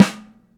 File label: Snare muffled